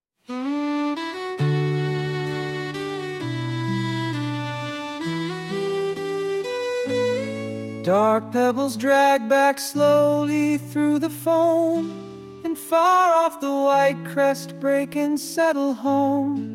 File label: wendy lullaby